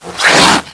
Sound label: demon alert